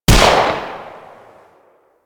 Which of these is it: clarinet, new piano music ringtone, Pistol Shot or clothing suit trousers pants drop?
Pistol Shot